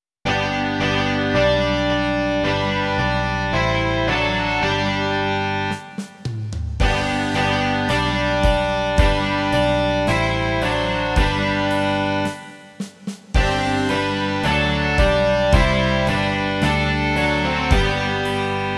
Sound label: rock